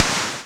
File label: noise fx; Masterbits; Switch FX